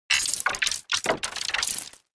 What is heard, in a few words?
Weird Random Sounds, cartoon; Miscellaneous Cartoon Sound Effects